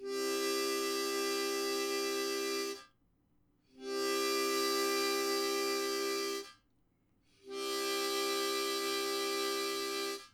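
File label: Blow Chord C Major, harmonica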